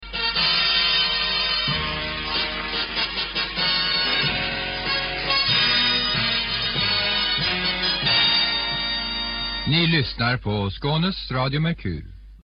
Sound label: jingle